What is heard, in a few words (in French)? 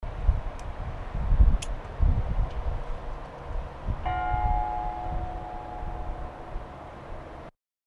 cloche; Vernon